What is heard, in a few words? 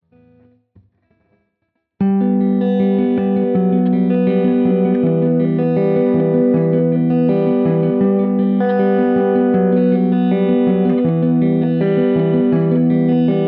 open chords example